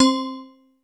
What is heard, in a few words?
INDO STEEL, TONAL PERCS; Crypton - DrumBase5000